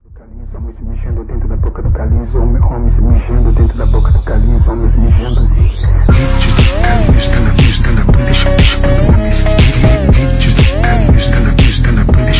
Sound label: phonk